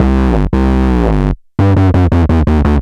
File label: Bass B dist 170 C, drum n bass 170bpm; musicradar; Basslines B